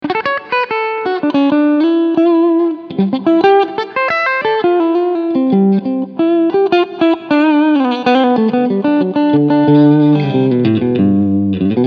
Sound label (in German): Steg HB SC Clean